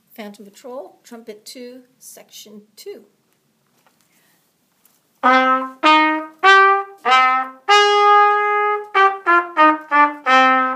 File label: Trumpet, Cornet